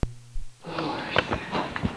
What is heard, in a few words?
horse